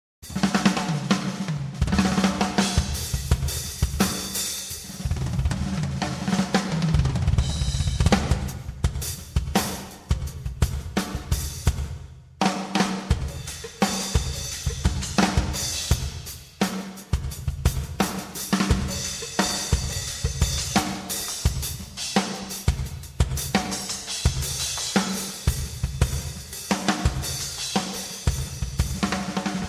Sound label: fills